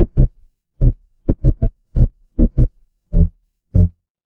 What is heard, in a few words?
magic scifi shield